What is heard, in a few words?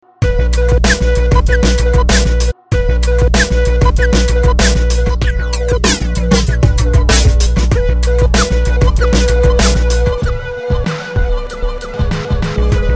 Scratch Tha Beat